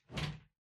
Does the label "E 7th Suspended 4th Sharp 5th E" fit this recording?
No